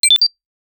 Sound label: a very quick almost subli